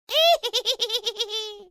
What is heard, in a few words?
Witch laugh; Halloween; fetes